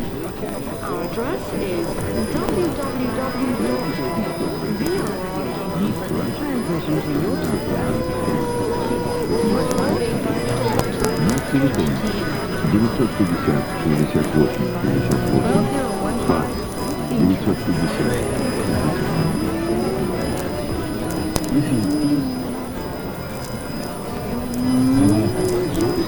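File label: radio noise, atmospherics VLF; Norrskenslandet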